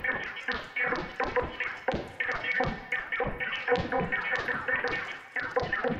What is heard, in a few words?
Tonal Re Draw, sparse soundscape